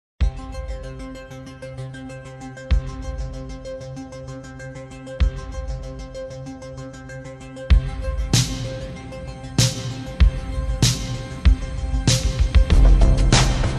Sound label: Theme Slow Reverb; Gta Vice City